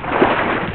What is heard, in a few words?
splash